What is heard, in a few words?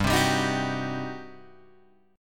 G Major 7th Suspended 4th Sharp 5th, G, down, Guitar, Open G